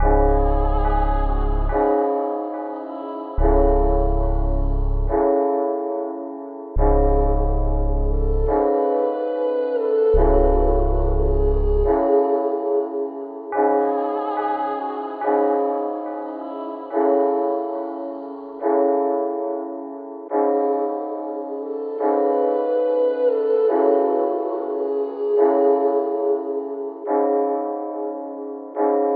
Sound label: 142 BPM C# Min, Melody Loops - Drill; Snowfall